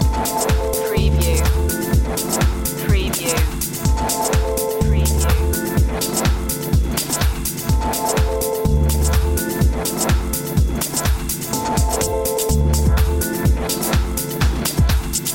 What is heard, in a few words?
disco lights loop